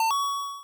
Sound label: MOVE; C64; Commodore; C64 Dreams; Multi-Sound Default